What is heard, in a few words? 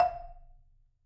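F2, Marimba, dead note; Sampleheads - Dave Samuels Marimba & Vibes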